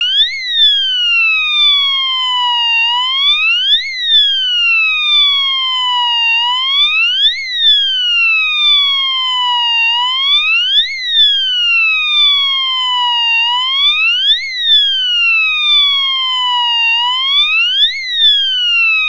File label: FIRE, Piezo Siren